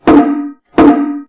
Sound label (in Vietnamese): Tùng: đánh vào giữa mặt trống, âm thanh kêu to, trầm và ngân dài, Trống Chầu Hát Ả Ðào